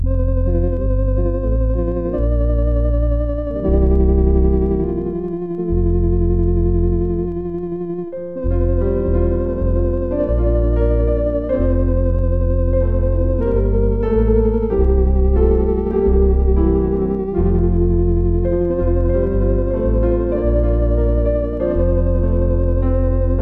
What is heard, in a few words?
Now Thank We All Our God, Piano Organ